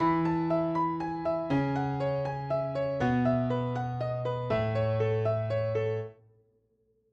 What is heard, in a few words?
Piano Melody Tempo 160 Triplet Time Key E Major